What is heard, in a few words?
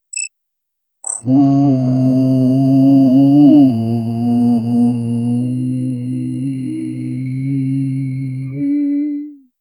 soft non verbal ghost voc; vocalizations